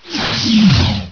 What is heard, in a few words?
respawn